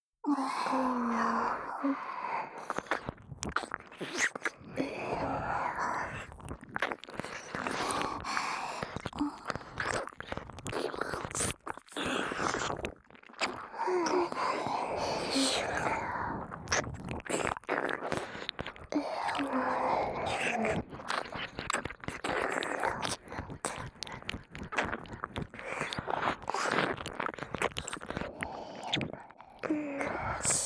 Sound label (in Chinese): 双耳舔舐循环